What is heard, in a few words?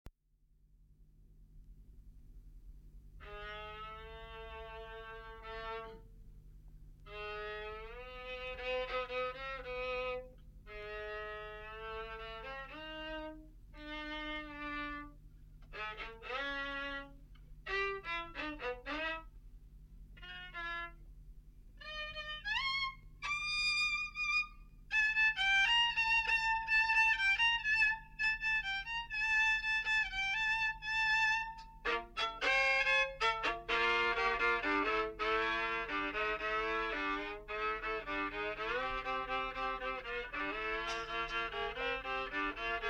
Violin